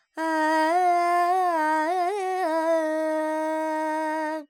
Phrygian, Vocals, Carnatic Female, Alaps, Dry; 70 G; Sample Banks

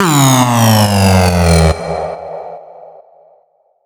FX Downlifter; Sample Banks; Percussion

